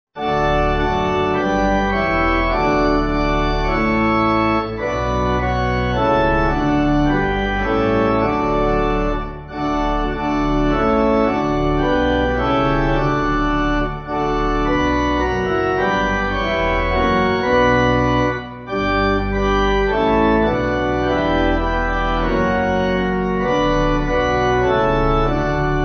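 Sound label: Pipe, Pipe Organ backing; Christ Whose Glory; Ratisbon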